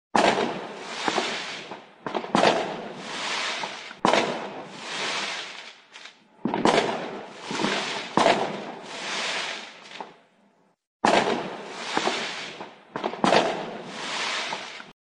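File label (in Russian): Zapuskajut feierverk, fireworks being launched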